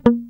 GUIT Mute A#2; Best Service Gigapack 2

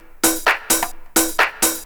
drum 130, techno trance drumloops

